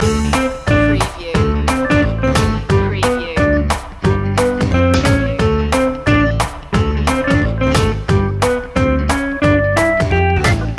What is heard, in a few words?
mambo loop